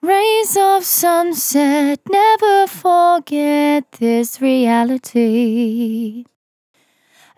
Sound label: On A Star 65bpm F, Vocal; Zero-G